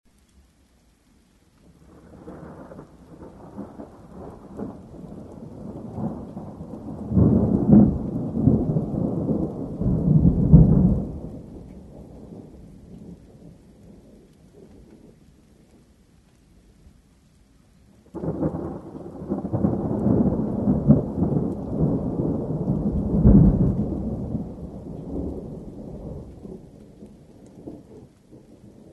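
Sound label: zvuki molnii, lightning sounds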